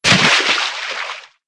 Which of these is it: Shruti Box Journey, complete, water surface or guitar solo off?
water surface